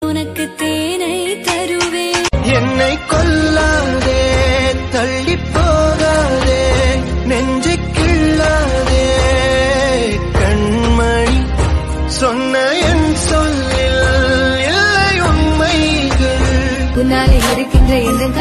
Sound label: tamil love ringtone